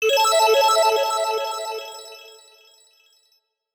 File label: Barrel Course Completed; Donkey Kong 64